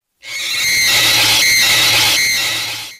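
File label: scary grinding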